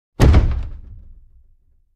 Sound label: Large Chest Dropped